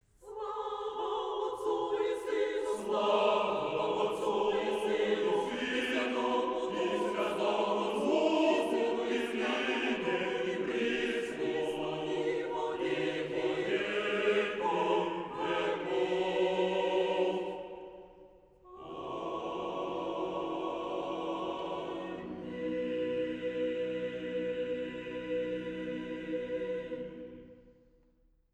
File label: choir; midas; dts